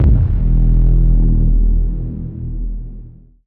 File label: Boom Low